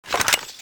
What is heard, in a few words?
rifle draw, weapons; sprifle